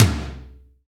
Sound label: TOM TOM, Real